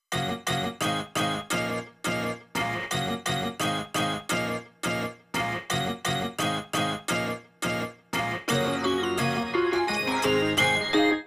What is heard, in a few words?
solo track